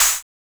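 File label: OHH, open hi-hat; X-Static Goldmine 1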